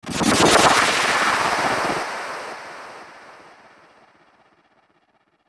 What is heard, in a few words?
Game, transition